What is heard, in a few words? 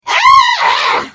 Hit; The Elder Scrolls III - Morrowind